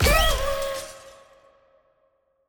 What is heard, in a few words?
Winner Celebration